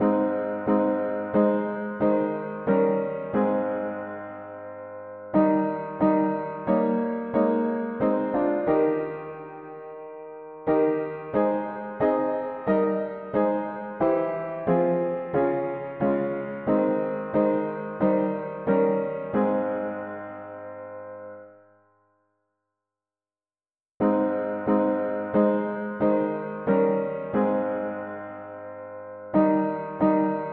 mono piano, hymns; Though in a foreign land

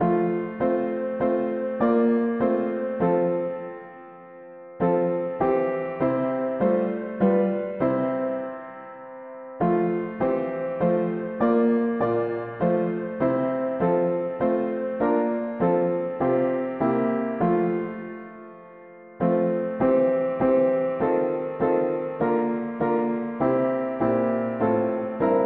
mono piano; hymns; O God of matchless grace

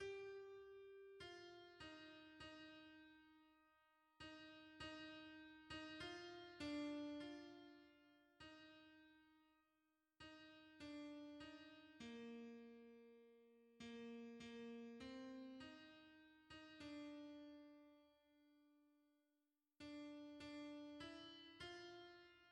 alto, hymns; O mon sauveur

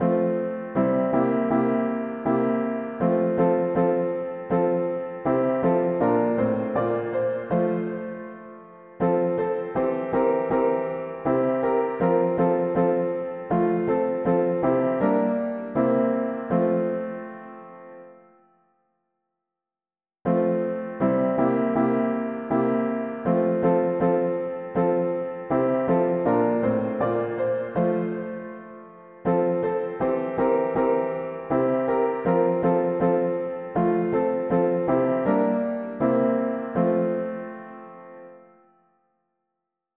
There is a stream of precious blood mono piano; hymns

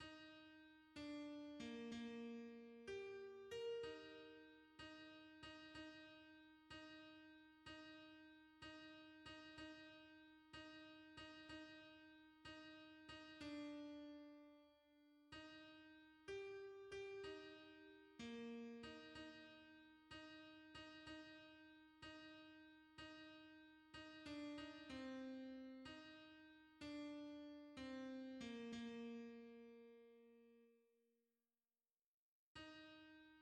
alto; C est notre Dieu; hymns

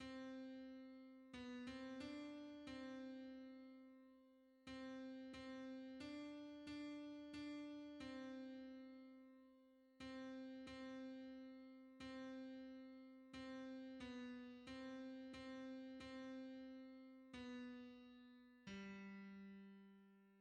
tenor, hymns; Paix douce paix

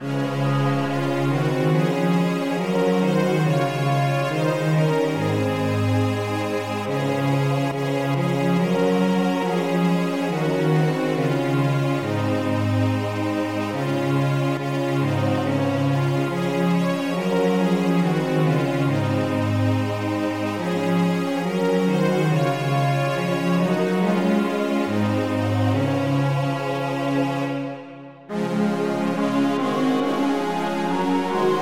polyinstru; hymns; Jesus shall reign where er the sun

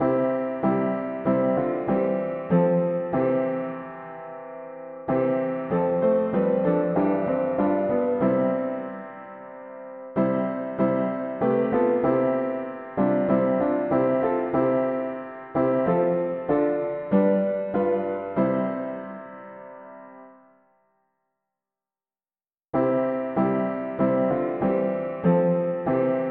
mono piano; hymns; Saviour in love divine

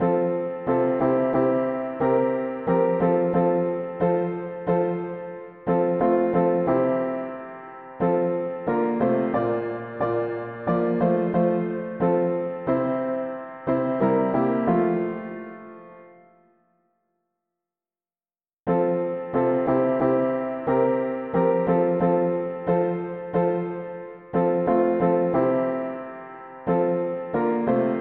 No act of power could ever atone mono piano; hymns

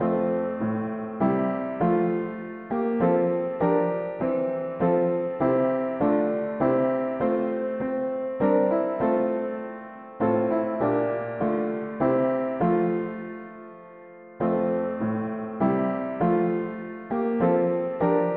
mono piano, hymns; Paschal Lamb by God appointed

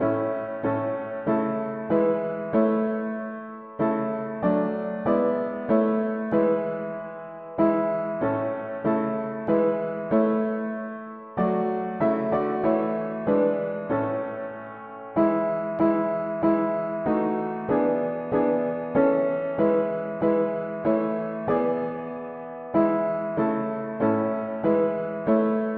mono piano, hymns; Oh what shall we do the Saviour to praise

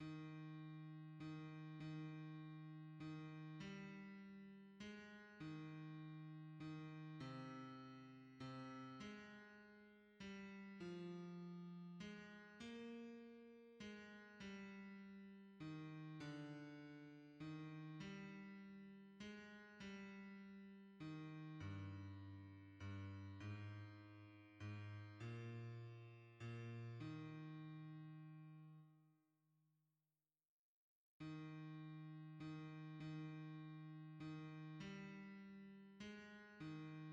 bass; hymns; May the Saviour s love and merit

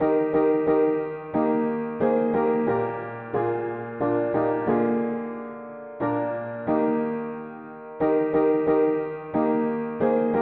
mono piano, hymns; Blessed Lord our Halleluyahs

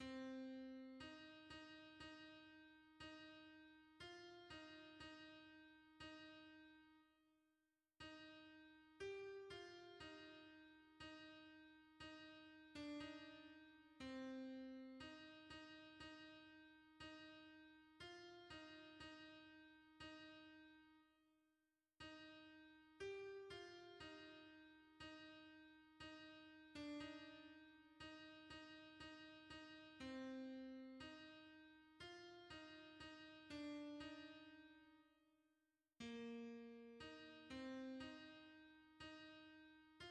alto; A l Agneau revient toute gloire; hymns

